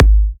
Kicks G#; markus hakala

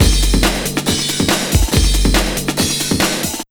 Big Beat Drumloops, SPEED 140

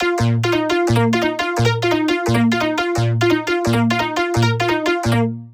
altered trance arp; lead arp chord melody

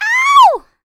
Vocal, R&B & Dance, FEM. HUMAN; ILIO; Vocal Planet VOL-4

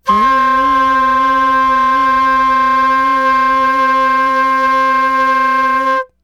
FL HUM C6, FLUTE HUM; Greytsounds - Philip Wolfe David Crozier's Saxophones

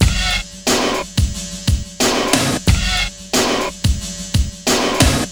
BPM 90; AKAI S-Series CD-ROM Sound Library VOL-4

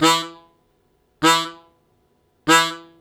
Short Attack E0, Harmonica; Zero G - Harmonica

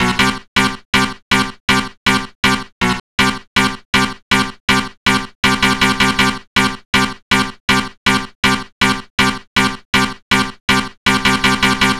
Dance Synths, Hardcore Loops, Synth Loops

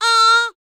D BIT, Vocal R&B Dance

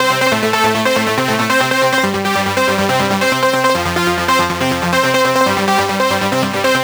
NRG Arp, trance

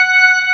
B3 FULL; E-MU Formula 4000 Series Vol. 3; Analog Odyssey

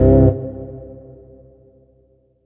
STAB 3angle; Polyend; Plughugger

